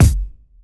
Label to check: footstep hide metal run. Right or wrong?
Wrong